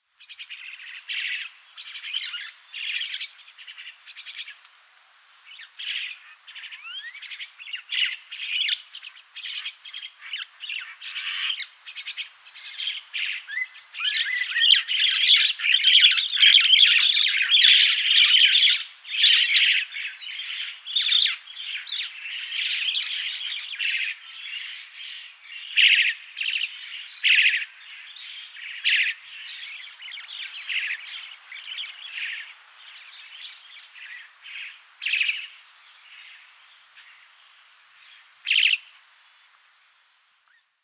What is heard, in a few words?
crested myna